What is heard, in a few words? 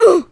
grunt; Messiah